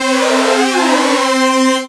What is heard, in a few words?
hypersynth swarm